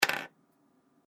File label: Game Piece Fall, thud; Object Sound Effects